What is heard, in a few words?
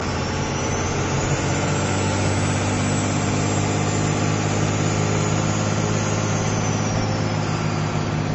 Cummins 59 Turbo